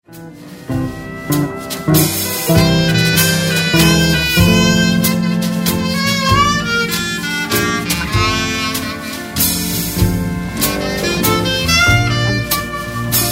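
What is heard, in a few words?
clarinet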